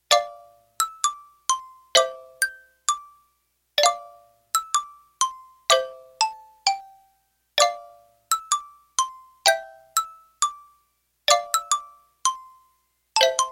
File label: click